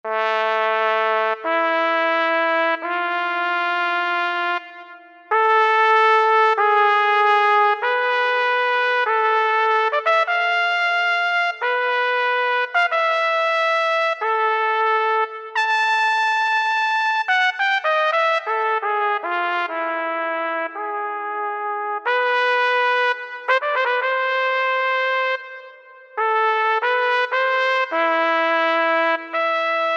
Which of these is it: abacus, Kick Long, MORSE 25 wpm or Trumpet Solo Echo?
Trumpet Solo Echo